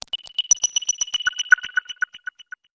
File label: Digital Text Data Drop, stinger